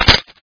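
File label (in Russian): перезарядка со щелчком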